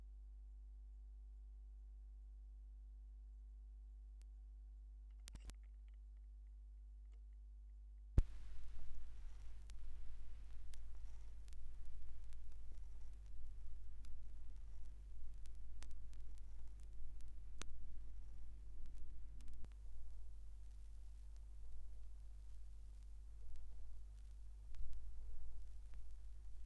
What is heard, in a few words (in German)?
Schallplattengeraeusche; rauschpartikel